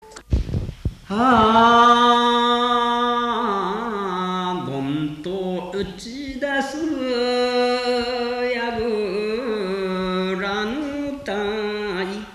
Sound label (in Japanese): hanabusakiyari, minyou